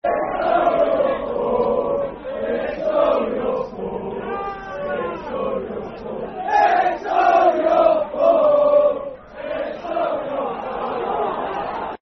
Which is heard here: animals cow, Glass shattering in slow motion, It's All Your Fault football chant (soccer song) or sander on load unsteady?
It's All Your Fault football chant (soccer song)